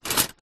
household stainless steel cutlery movement in drawer; zapsplat